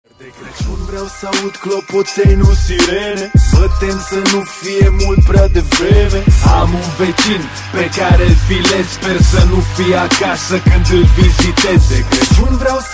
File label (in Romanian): de craciun